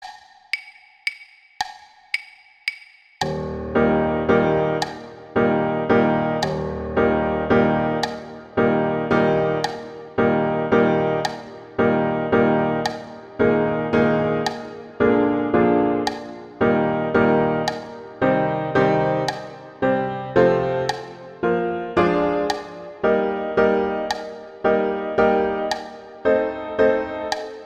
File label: Allegretto pomposo; Saint-Saens; Elephant